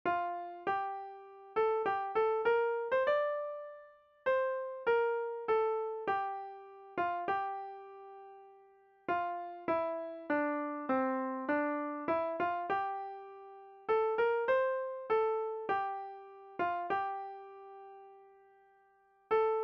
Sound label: hymn melody